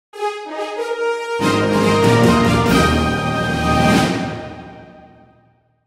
Quest Completed; ChemCaper Act I Petticles in Peril

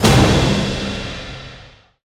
Stinger; Skylanders Cloud Patrol; Arcade, iOS, Mobile; MU AMB Boss Outro

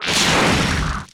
Enemy Tail2 Armor Equip

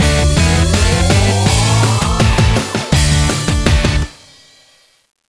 Poprock Fin; Imagine Modern Dancer; Nintendo DS

